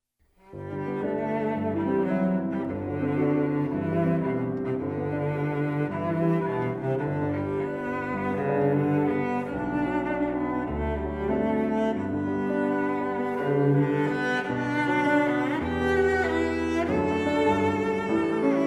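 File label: cello sonata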